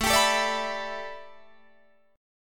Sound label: A 9th Suspended 4th Guitar Open D, chord